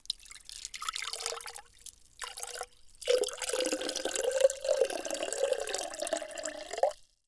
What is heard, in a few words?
Liquid Water Water Pour Into Tall Glass